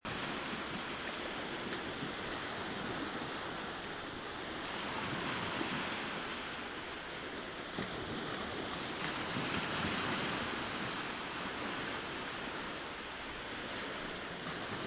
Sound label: waves lapping